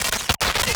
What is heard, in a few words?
Glitches; Antidote Advent